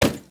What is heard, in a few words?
hit; Soldier Way